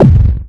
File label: Dunk, Drums, Industrial